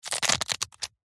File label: horror bone break snap twist or crush; zapsplat